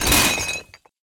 Glass Trash Scraping

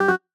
Arcade 8 Bit Two Notes; free game sound effects library

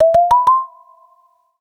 MultiTone UI

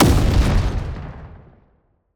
Explosion Blast Sci Fi

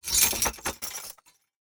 Metal Chain Rattle Iron

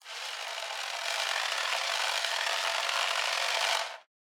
Wheels Long Robot

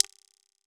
Ratchet Thin Mechanical

